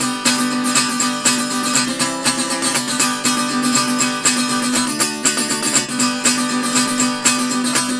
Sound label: Tenor Guitar, Appalachian; Sonic Foundry (Sony Creative Software); Continental Drift Aftershocks